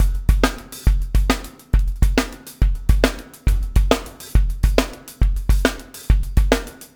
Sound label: PEAK, Backbeat